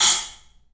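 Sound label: club cymbals; 3D Sound; 3D Drums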